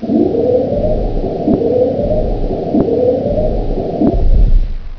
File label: disaster; ambience